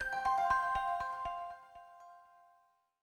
ringin; Windows; Glass

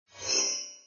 Menu Popup; Windows; Whistler; Whistler2

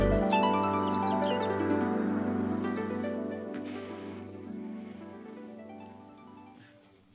Harp Transition

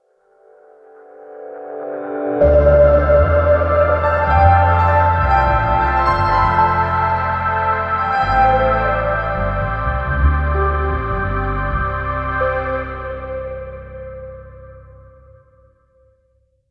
Startup; KDE Borealis

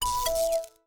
Windows Logoff Sound

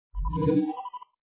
Default Sound, Windows; Whistler

